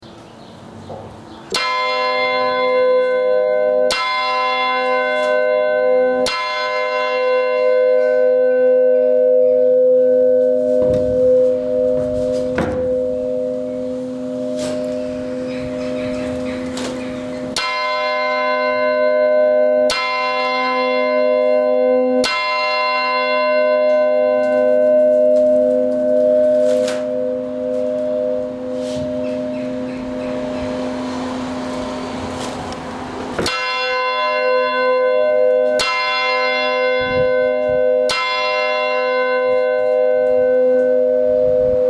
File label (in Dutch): Lambertuskerk Bingelrade Angelus Klokje; het Angelus klokje gedurende twee minuten